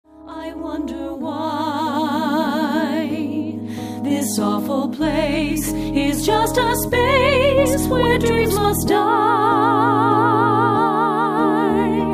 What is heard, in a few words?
Lullaby